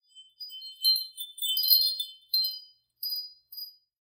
Wind Chimes C

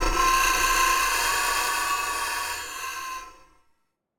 horror distorted scream; soundscrate

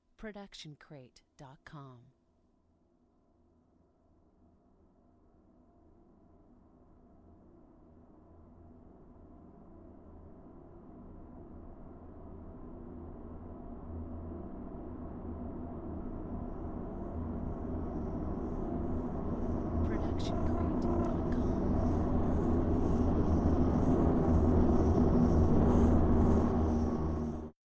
Creepy Reverse Riser; SoundsCrate

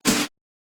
lightsword clash; soundscrate

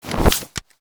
fabric and clip; soundscrate

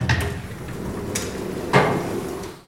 elevator door open; soundscrate

